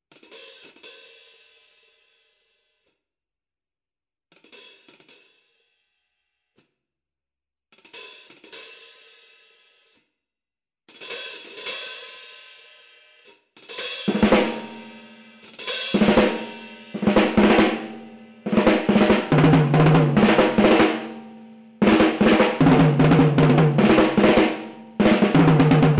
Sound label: Drum; Squilli; olofonia